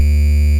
Buzz; Bitkits

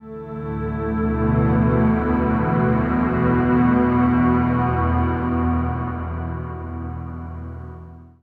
Field Up, Acoustic Drone, Long Loops; Sonic Foundry (Sony Creative Software) - Friendly Fire; DroneLoop

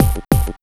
Loop Bit, Processed Percussion; Sonic Foundry (Sony Creative Software); Rhythmicronics

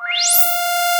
SWP ANALOG PAD; PADS; SYNTH FX

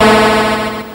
Atari Noise Long, Atari 2600

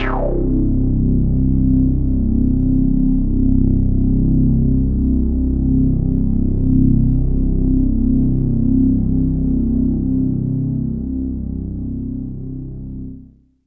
BASS, Synths

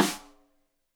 Rim Shot Stereo; Deeply Sampled Drums; SMDrum

